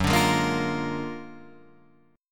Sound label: Gb Augmented Major 9th, down, Guitar, Open D